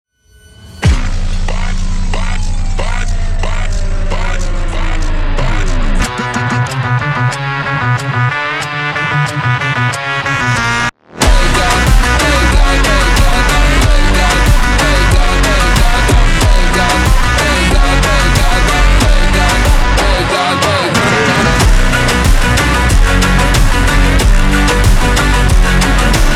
Montagem rock Version slowed

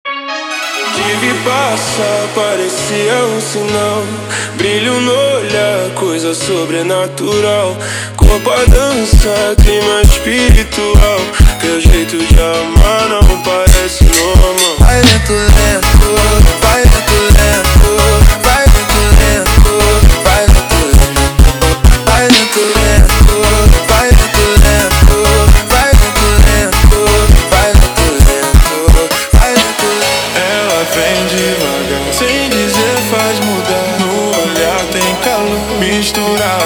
Montagem slowed

